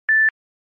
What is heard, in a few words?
dong ringtone, sms